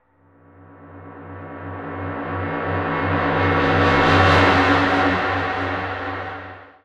TECHNO FX 140

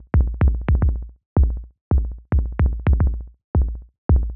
110 Driven, Percussion (Stereo); Touch Loops; ElementsOne; Abstract Rhythms

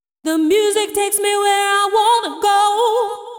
deep house female, 125, A, vocals

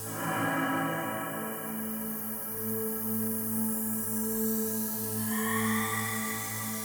TECHNO PAD 140; Keys

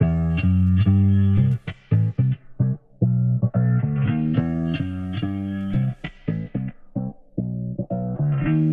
Warped Bass, 110 C; Loops; Hustle Intro; Touch Loops; CosmicFunk

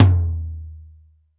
dry tom, acoustic set; drum

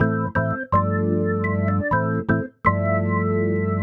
DEEPHOUSE ORGAN 125 A

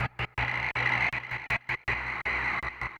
Industrial 160

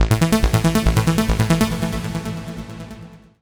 TECHNO EXTRA 140

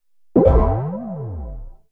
HOUSE FX 125

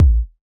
Kicks; Touch Loops; CosmicFunk

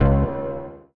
Bass, One Shots; Touch Loops; BlissedOutBeats

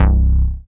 BASS MONO

